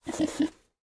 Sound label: boar snort; smite; Apollo